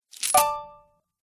Magic Treaure Coin Pick Up, video game

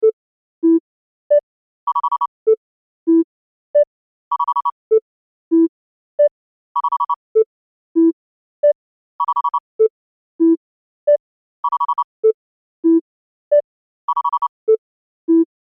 Science Fiction Beeps Control Room Chime; Tech Sound Effects; Tech (Sound Effects:Science Fiction)

